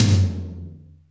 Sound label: Toms, Real